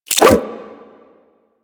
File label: Nunchuck Start Whoosh; Warframe; Infested